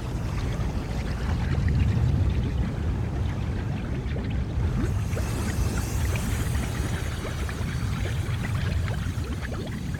magma 火营地

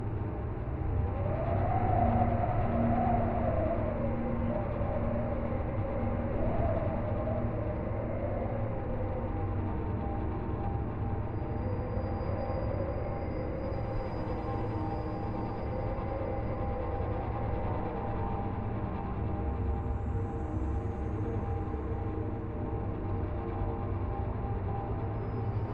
冰霜神殿音效; 2021西路背景音效

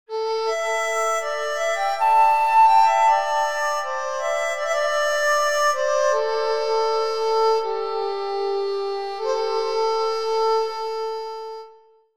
笛声独奏

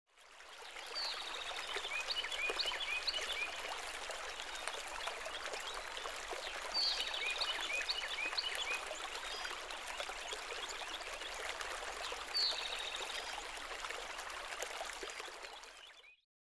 桃花林主题区域, 音效

